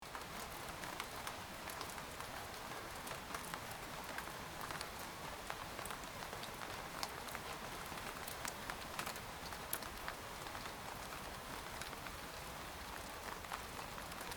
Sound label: cozy midnight rain